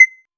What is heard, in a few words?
for a good move, gameplay